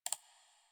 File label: Computer Mouse Click Misc; Warframe; Ambience; Nineteen Ninety Nine